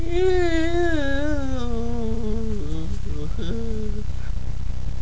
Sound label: capote noise